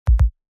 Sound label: notification bad